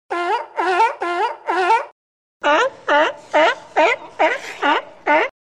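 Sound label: fur seal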